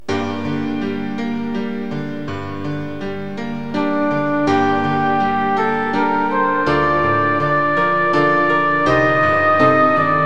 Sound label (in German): elegie floeteneinspielung